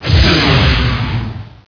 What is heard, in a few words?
Ion Cannon Starship; XWing